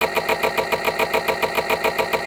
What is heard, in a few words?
rotator motor, Electric Motors; 3maze